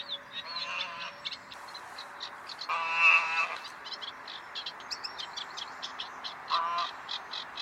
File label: Swan goose